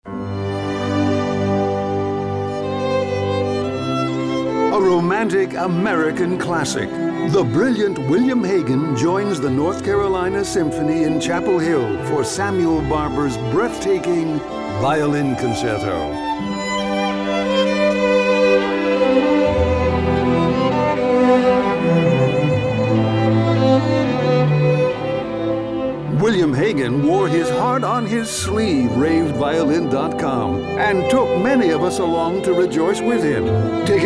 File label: Violin Concerto; Barber